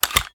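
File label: pistol reload fast extended mag mag out; gmod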